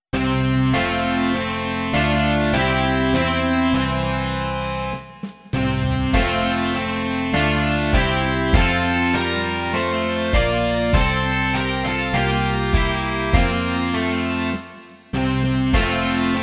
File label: rock